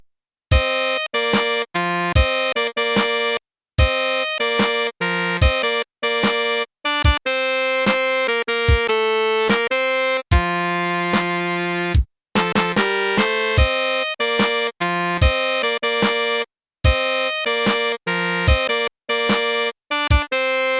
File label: MIDI, ringtone; Scrubs